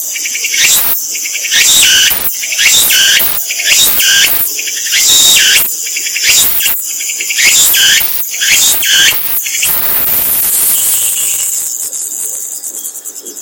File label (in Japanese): koe tukutukuboshi